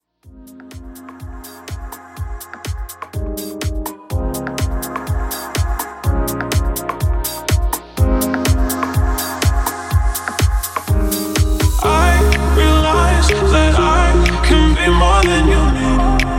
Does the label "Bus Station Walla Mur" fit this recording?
No